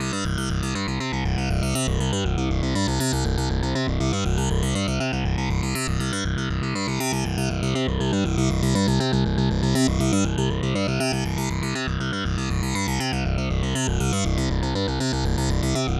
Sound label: 120 C synth, Synth Loops, Trance; Trance Explosion Vol1